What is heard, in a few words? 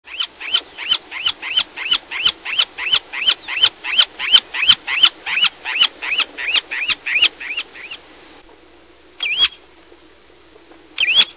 Semipalmated Plover